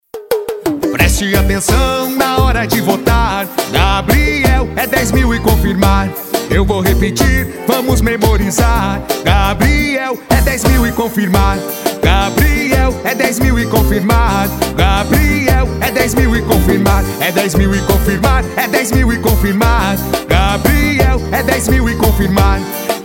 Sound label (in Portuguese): Jingle DEP ESTADUAL; Bateu no Coracao; Gabriel Cordova